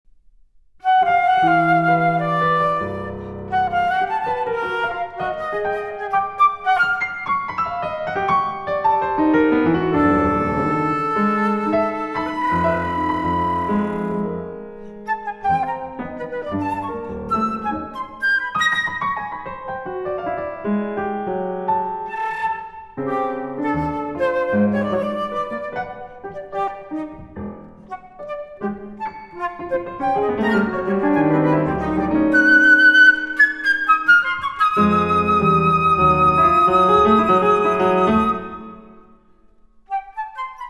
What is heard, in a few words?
Miniatures for Flute